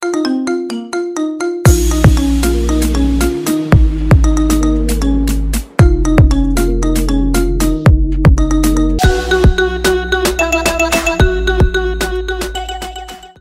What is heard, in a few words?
marimba remix ringtone